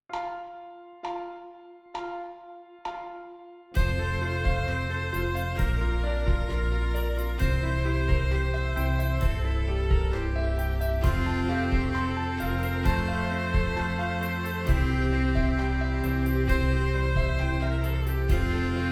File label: Exercise Horns in Fifths